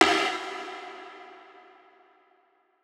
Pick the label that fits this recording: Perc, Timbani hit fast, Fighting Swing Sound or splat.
Perc